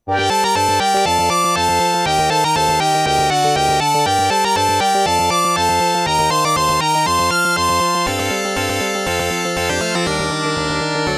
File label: title, sb 16 ct2740 adlib; Creative SoundBlaster 16 ct2740(adlib mode); soundcard test; Arkanoid 2